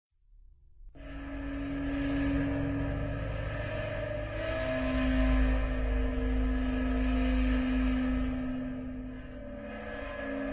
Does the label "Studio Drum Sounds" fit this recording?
No